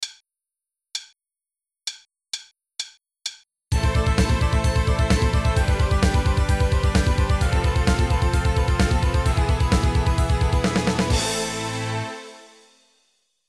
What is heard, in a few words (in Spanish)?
Tapping normal